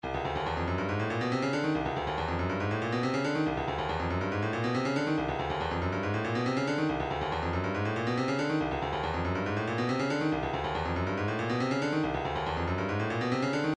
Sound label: 140 shaker; Rain Forest